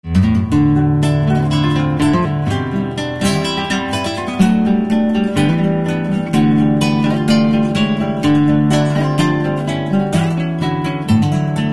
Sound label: acoustic